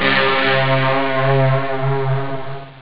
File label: super guitar; Amiga Soundtracker Sample Packs